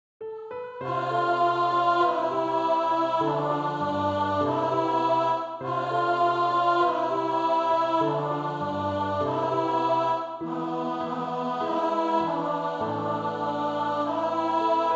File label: Alto